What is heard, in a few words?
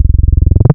303 Acid